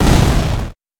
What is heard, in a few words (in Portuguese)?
Explosao